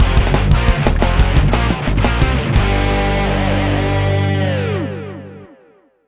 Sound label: Fight - Win; Super Monkey Ball 2